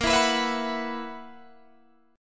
B Major 7th Suspended 4th A sharp

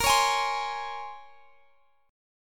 Bb Minor 9th Bb

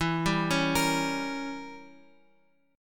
E Diminished 7th, down Guitar Open F; Edim7 arpeggiated; JGuitar

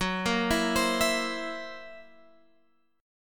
Gb 7th Flat 5th Gb down Guitar Open G

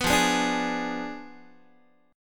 F Diminished 7th F down Guitar; 14,13,11,12,12,x; Fdim7 strummed; JGuitar

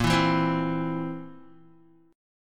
A sharp Suspended 2nd Flat 5th A sharp, Guitar; strummed; JGuitar

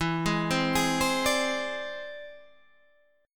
E Augmented 7th E down Guitar Standard; E+7 arpeggiated; JGuitar

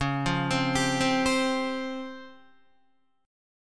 C sharp Major Sharp 11th, Guitar; C#M#11 arpeggiated; JGuitar

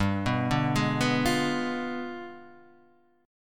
D Diminished 7th G

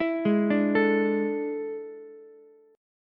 E 11th, Ukulele, C6; arpeggiated; JGuitar

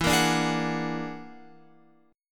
E 9th Flat 5th E, guitar

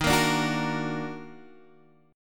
D sharp Minor Major 7th, Guitar Open D

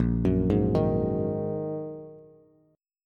Bb 7th Suspended 4th Sharp 5th Bb down Bass; arpeggiated

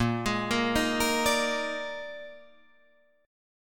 Bb 11th, Guitar, Drop D

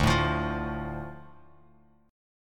D Minor D Bass Standard; Dm strummed; JGuitar

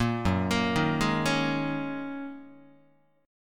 F sharp 6th Add 9th F sharp Guitar; arpeggiated; JGuitar

